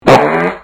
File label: fart